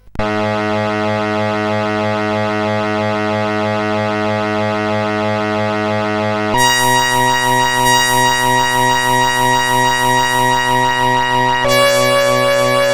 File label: sid, CSG8580R5